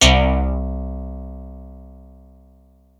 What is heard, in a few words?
syn c; Best Service ProSamples vol.55 - Retro Sampler; AKAI